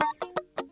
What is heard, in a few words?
CHUCK KUH, FUNK CHOPS, guitar; Spectrasonics; Hans Zimmer Guitars VOL-1